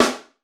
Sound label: GATED SD, snare; KICK + SNARE; Best Service; Hyper Dance